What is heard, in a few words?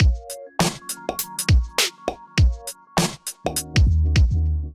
Kit groove 101 bpm, Drums; MIDI Clips; Moonlight; Ableton; Chop and Swing